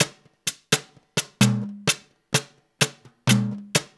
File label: LOOP, DANZON, Percussion